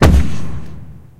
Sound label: atgm punch, guns tanks; War Thunder